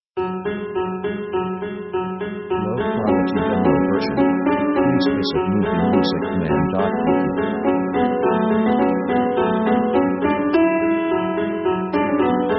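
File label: orchestral dramatic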